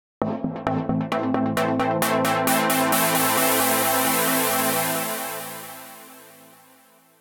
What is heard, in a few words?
poly arpeggio